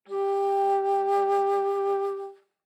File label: Bass Flute ff G4; Woodwinds